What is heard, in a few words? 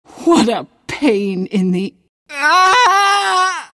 pain death; queenofpain